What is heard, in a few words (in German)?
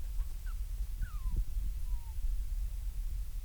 fiepsen, octodons